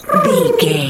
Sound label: pigeon emote